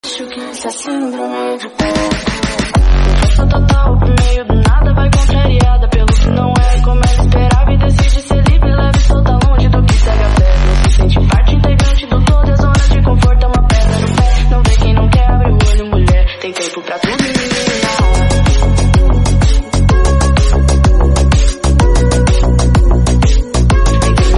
Groove; Peter Pan; English

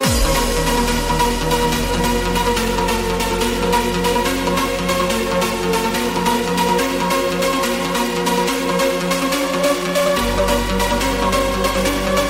adagio

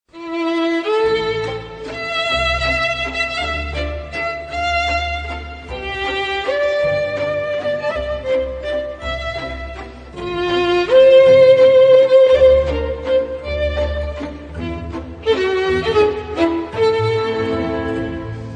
love music

